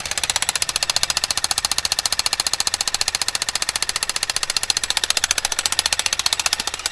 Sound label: jackhammer loop; weapons; rust